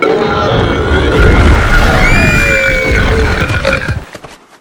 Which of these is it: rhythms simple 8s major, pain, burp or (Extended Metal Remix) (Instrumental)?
burp